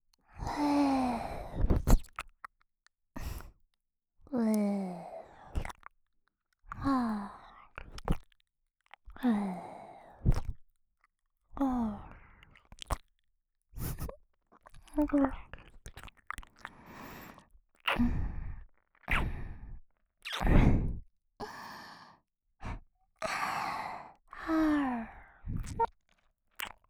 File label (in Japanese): 耳舐め(右); 突然サキュバスになったギャル妹がエロすぎる